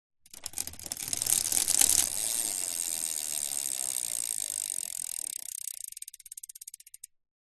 vehicles bicycle short pedal then stop coast wheel hub clicks